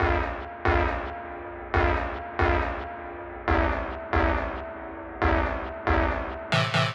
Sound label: Analog Stab Riff E, 138bpm; Zero-G Sound Sense Grime Scene; GS Construction Kits; Da Suspects; 90 s Sample CDs